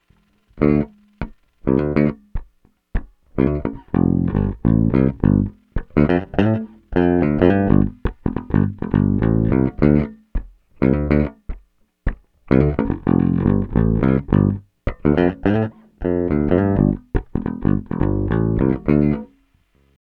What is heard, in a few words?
Bridge Pick Tone UP; Super Neo